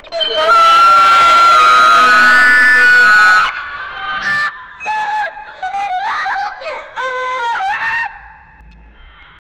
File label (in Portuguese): gritos misturados com ris; telephone